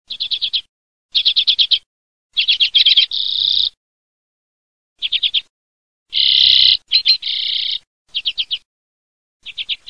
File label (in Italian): ORGANETTO